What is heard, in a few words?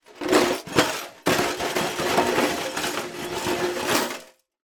foley glass broken bits in metal tin shaking; zapsplat